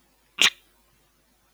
Music Musical instrument Percussion Drum Snare drum Rimshot, vocal imitation